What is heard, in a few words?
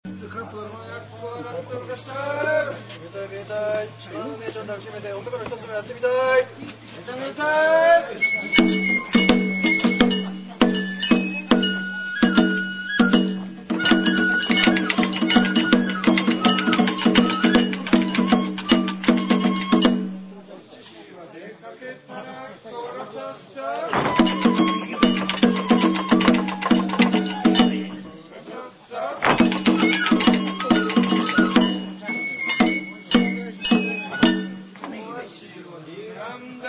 banjo